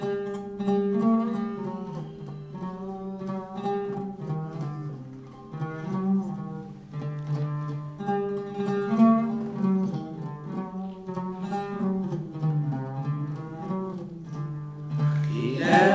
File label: Voix oud